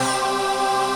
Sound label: VOICEPAD; Best Service; XX Large Pads; Sample CDs